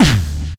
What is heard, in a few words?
VOC DRUM, MOUTH PERC; Best Service Hyper Dance